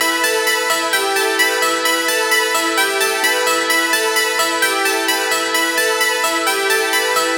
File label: 130 E Seq Sparkle; Zero-G; Future Wave